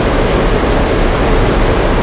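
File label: harbor ambience loop; SpecOps2 MPlayer Demo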